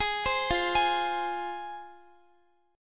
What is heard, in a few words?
F Minor, Guitar; arpeggiated